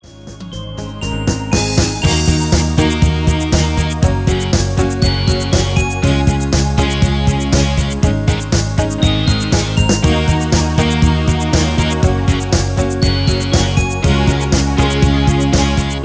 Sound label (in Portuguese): amostra gospel